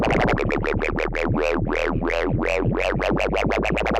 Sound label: kaossilator